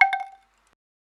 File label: perc; eddyflux